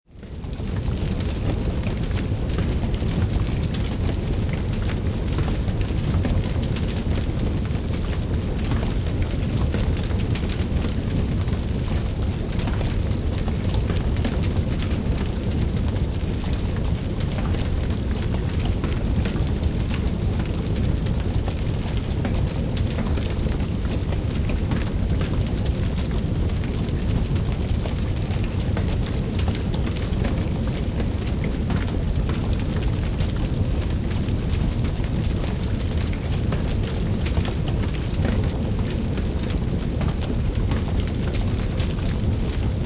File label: Fire Flames Crackle, Medium intensity fire burning evenly, cracking and popping flames.; General fire, wildfire or housefire. 2 minutes.